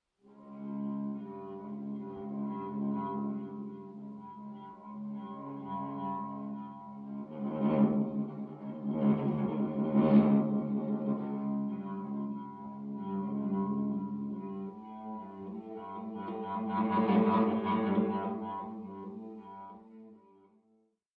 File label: cello